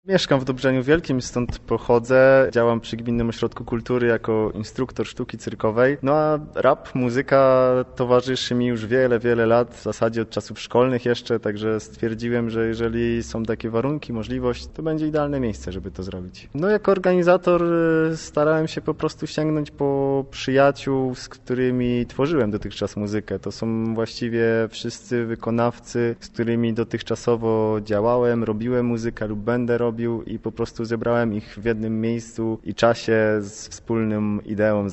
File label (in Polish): koncert rap hip hop; Gminny Ośrodek Kultury GOK Dobrzeń Wielki